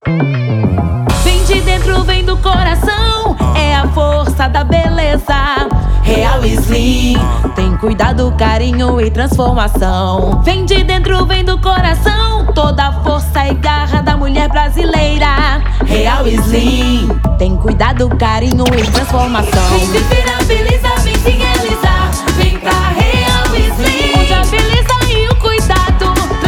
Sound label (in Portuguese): Jingles Institucional; Ouse Mídia; Real Slim